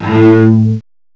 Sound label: CELLO; M8; CMI Factory